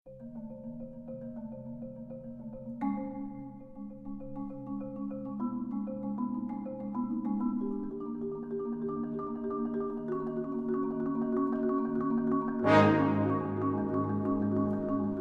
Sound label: concerto for percussion